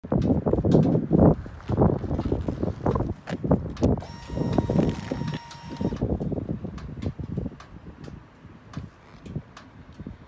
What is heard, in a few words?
Sportster S Harley Davidson Exhaust Sound walkaround Start up Sound Check